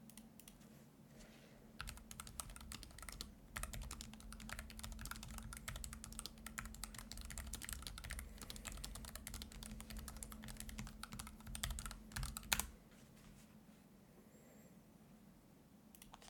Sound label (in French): son frappe